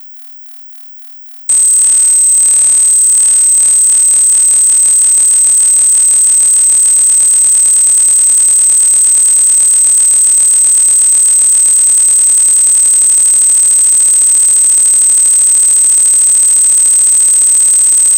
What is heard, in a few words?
Electrical Interference High Frequency Crackle; Glitchedtones; Noise Elements Electrical & Interference